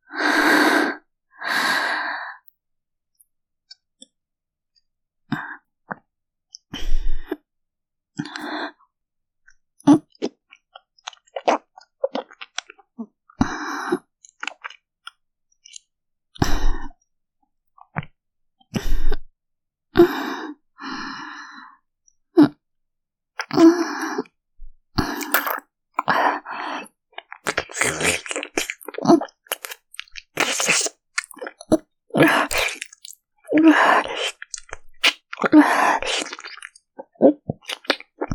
唾液交換; キス

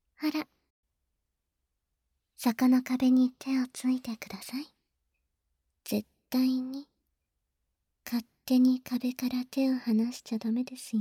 乳首責め; 効果音なし; ASMR

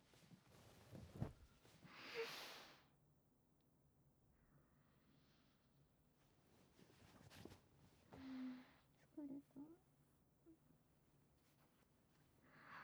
ひとりエッチ; 【ムラムラ上昇中】ガマンできなくて、ひとりエッチしちゃいます

